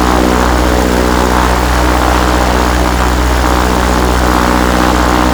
Aircrafts Engines Packard Merlin Prop; DCS

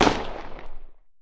explosion smoke far

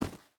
footstep boots gravel run; Rust

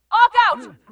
arc out; Titanfall 2; Pilots

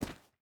footstep boots stones run; Rust

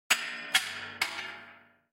shells 20mm hull

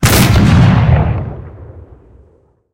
cannon 88mm Pak43 shot

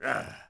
Knight Fort Vox; Civ4 warlords

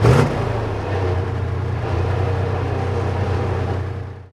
engines; World of Tanks

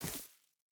footstep rubber boots grass run; Rust

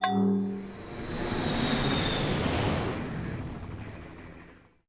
Airport; Buildings; Civ4 beyond sword

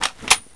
Inf Weapons Foley 3rd; Red Orchestra 41-45

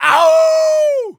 vce exclaim swing

